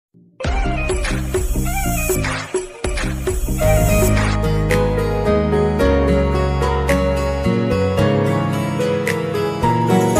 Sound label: instrumental background music romantic background music love ring